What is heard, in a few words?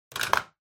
Pen Holder Take In